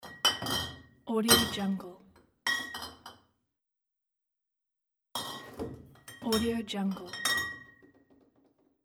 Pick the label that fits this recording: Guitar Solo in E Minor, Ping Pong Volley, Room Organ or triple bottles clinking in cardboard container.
triple bottles clinking in cardboard container